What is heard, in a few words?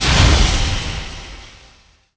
Spaceship Takeoff